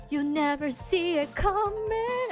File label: Singing you'll never see it comiiing